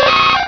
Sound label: cry